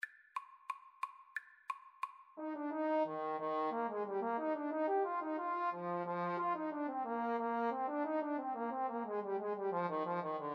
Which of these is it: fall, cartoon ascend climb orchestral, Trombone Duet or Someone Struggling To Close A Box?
Trombone Duet